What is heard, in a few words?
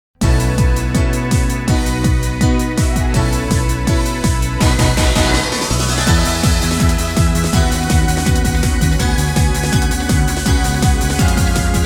off vocal ver.; Mahou Sensei Negima